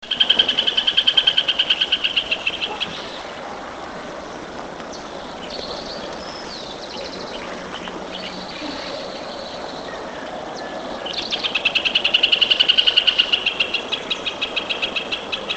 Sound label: Hornero